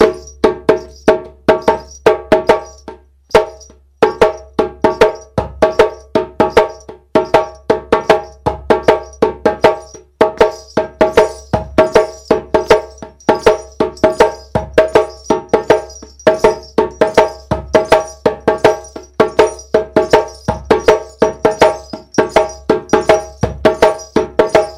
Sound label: Kassa, djembe